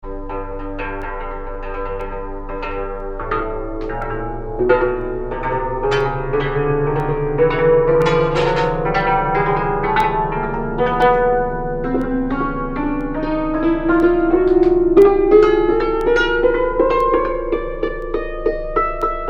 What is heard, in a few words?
chromatic, tsimbl